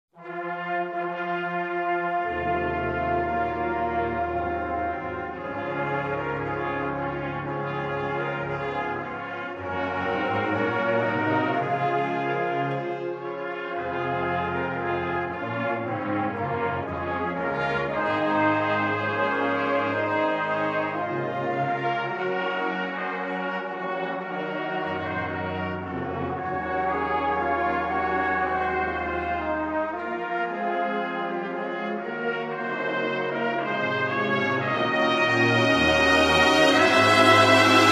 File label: Alma Mater Pep Band